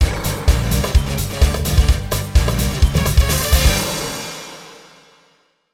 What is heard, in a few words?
Battle Intro; Paper Mario - The Thousand-Year Door (GC DSP); Glitz Pit